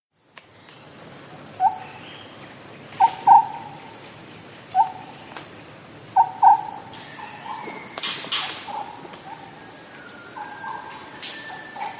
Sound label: jungle owlets, geckos; soundscapes